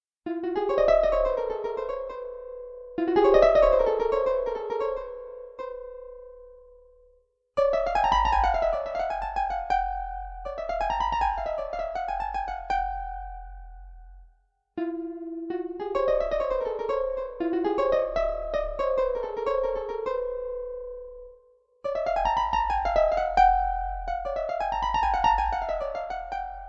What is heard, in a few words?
Arpa